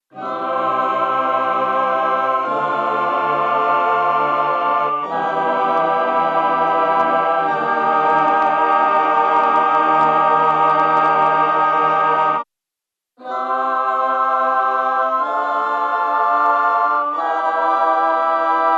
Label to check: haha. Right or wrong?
Wrong